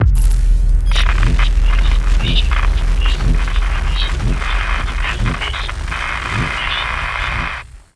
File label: mysterious signal; Stellaris; nemesis